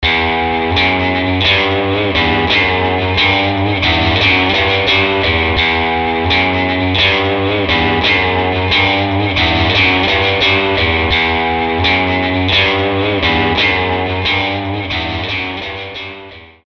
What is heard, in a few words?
Secret Agent Guitar; Apple GarageBand 2; Apple Loops